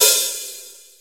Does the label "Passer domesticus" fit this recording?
No